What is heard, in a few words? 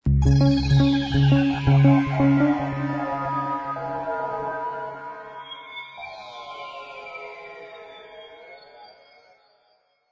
Music Theme